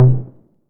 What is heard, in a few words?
808 MT Tape Sat, Tom; Goldbaby Tape808 & Tape909